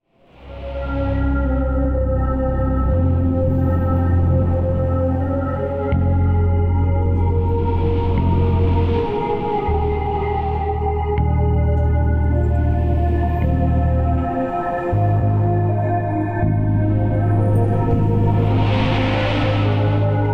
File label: Gas Planet Music and Atmos, Gas: wind, low flute and whistle melody